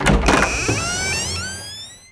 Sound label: door open; DAoC